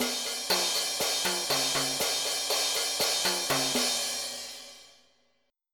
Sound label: BOSSA